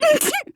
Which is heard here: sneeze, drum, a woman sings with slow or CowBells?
sneeze